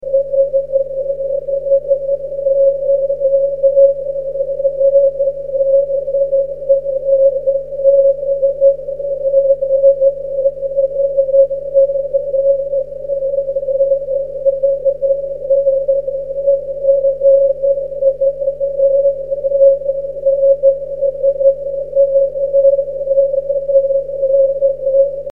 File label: 6cm; G4NNS